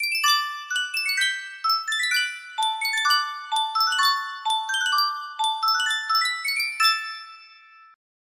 sankyo miniature music box

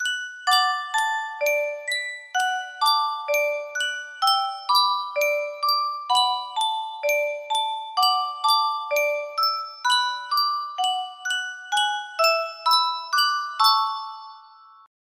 music box, music box melody

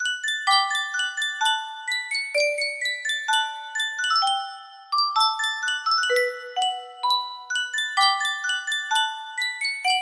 Sankyo Music Box

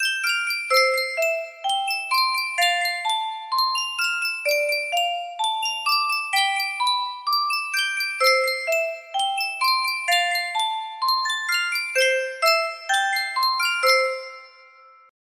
yunsheng music box, melody

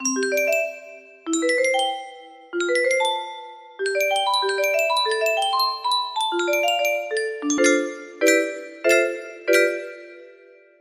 melody, music box

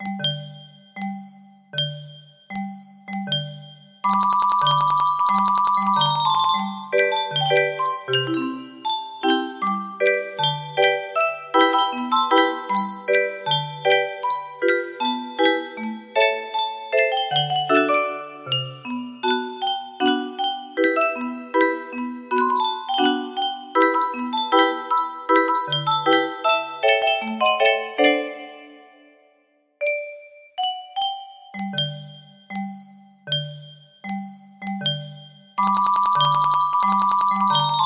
clunky, music box melody

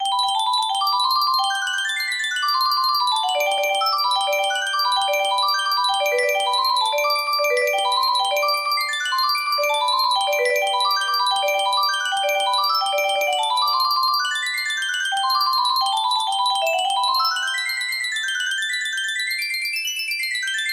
in G major, music box melody

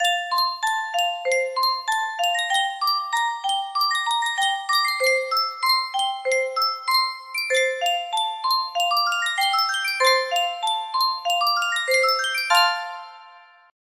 Sankyo Music Box, music box melody

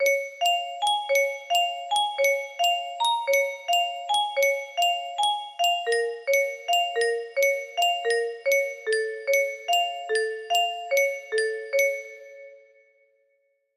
music box version

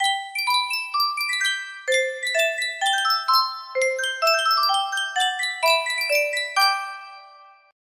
sankyo miniature music box, music box melody

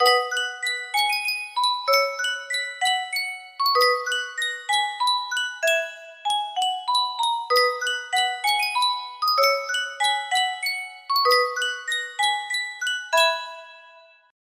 Sankyo music box, music box melody

